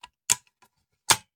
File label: Machine Gun Foley; Sound Ideas; Guns